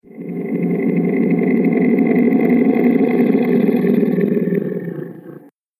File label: Hairy Slime Worm, monster sound effect; Fantasy Sound Effect; Andrew Fleming